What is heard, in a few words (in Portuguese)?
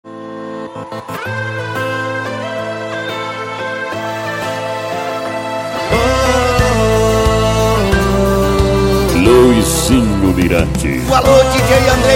sertanejo com locutores de rodeio